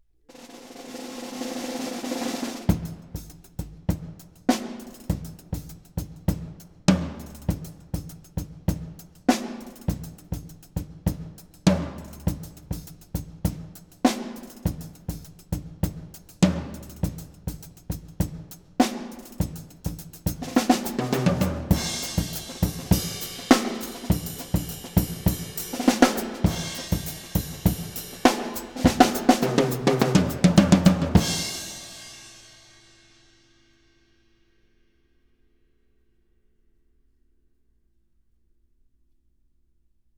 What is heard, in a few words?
Percussion Imaging Tests, Drum 6 Feet, Live Studio: Test